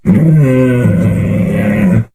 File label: Tiger growl; SyrinScape; Waterdeep